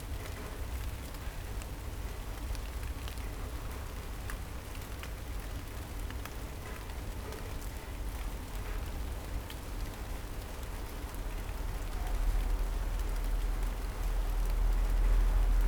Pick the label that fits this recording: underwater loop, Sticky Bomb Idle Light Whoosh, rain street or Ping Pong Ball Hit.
rain street